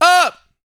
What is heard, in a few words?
VOCAL, R&B Dance, HIP-HOP